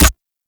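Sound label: Infested Mech, bow; Cernos; Weapons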